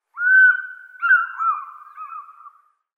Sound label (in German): Schreiseeadler Haliaeetus vocifer; Vogelstimmen